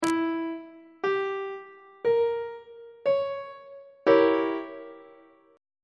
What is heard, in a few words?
b flat diminished 7th 2nd inversion on treble clef